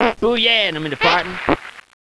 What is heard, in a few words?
fart